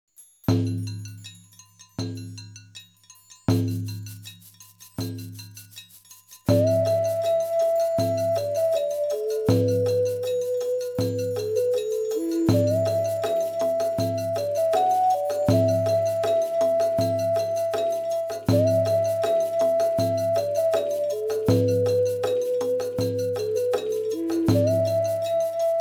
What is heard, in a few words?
Eskimo - Inuit; anno 1503 gamerip